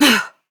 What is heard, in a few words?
Pain, Adult Operator; New War